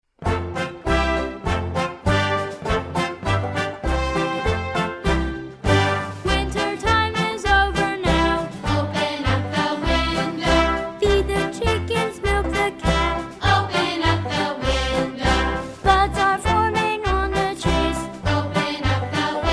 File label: open the window